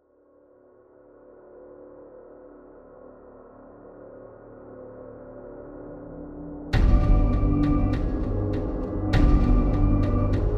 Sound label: scary music instrumental; آهنگ های بی کلام ترسناک